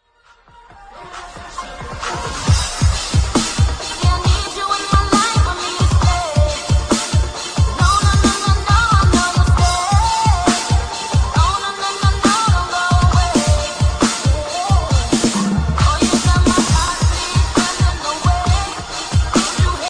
electro superbass dubstep remix

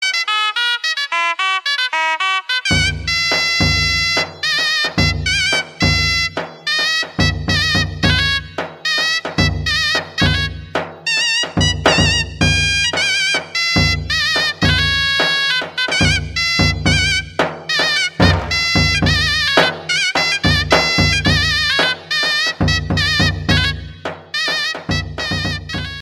Nokia Arabic ringtone

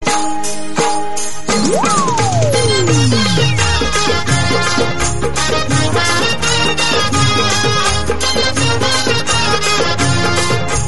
marathi music ring

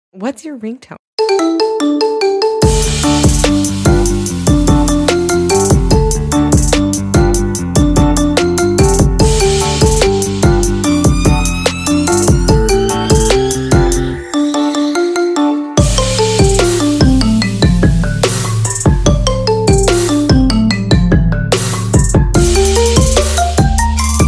marimba remix; for iphone android

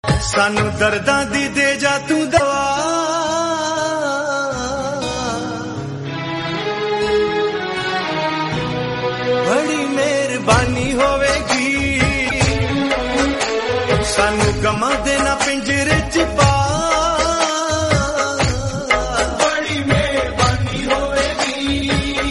heart touching punjabi sad

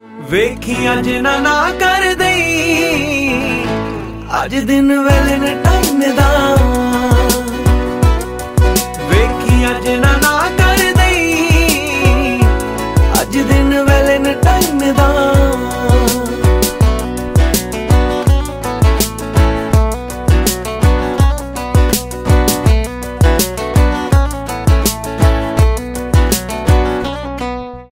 Punjabi ringtone

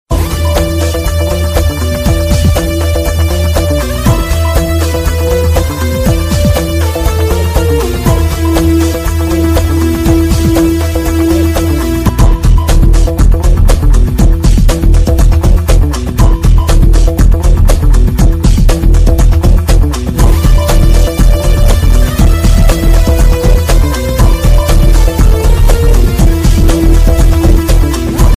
instrumental, ringtone

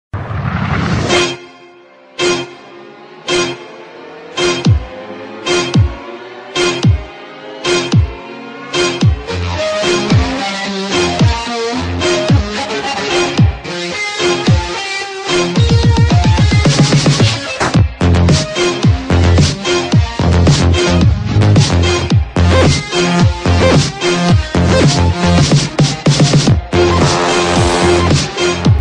sony ericsson electro

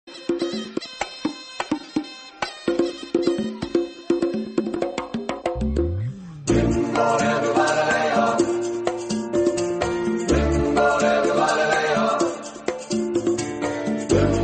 afrikanse sms

